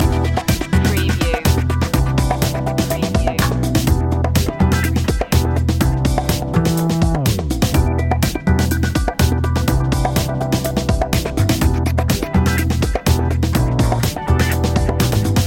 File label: u funk loop